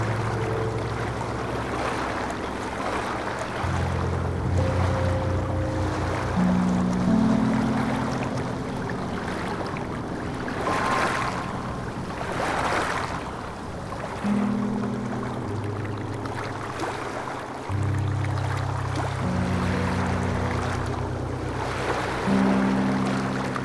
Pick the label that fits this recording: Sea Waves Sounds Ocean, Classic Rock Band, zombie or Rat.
Sea Waves Sounds Ocean